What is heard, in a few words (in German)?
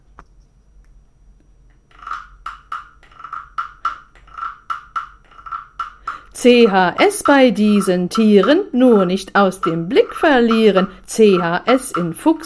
Rap; CHS-Wörter-Rap